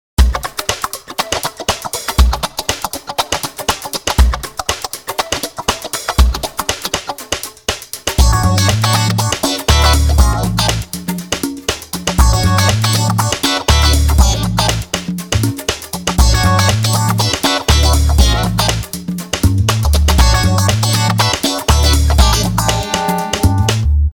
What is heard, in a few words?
Funk; Scarbee